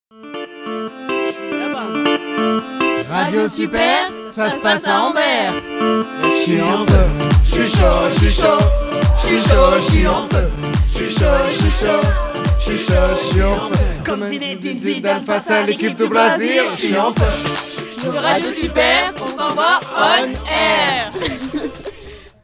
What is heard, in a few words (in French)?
Jingles; les Jeunes d'Ambert en Feu